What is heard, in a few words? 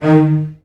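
CELLOS F3, Synclavier Strings; ILIO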